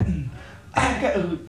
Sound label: live, Beatbox, Drum loops